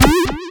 Hitsound retro

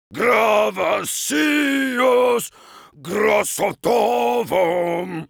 Heavy singing, fr

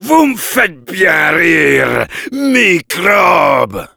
yell

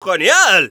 positive vocalization; Scout

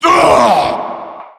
pain

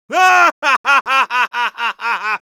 yell; Heavy

